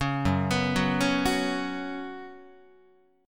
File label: Gb Suspended 4th Gb, down, Guitar; arpeggiated; JGuitar